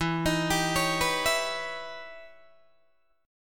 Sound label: E Minor Major 13th E Guitar DADGAD; EmM13; arpeggiated; 14,18,17,18,14,14